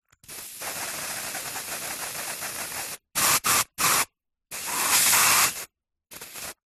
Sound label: household deodorant aerosol spray various